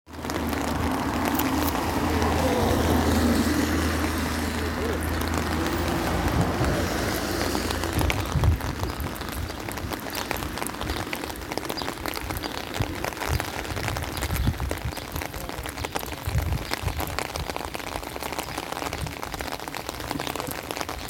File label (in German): regen mit tram